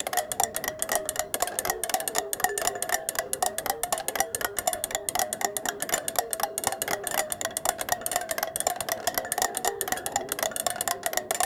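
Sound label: many steampunk style music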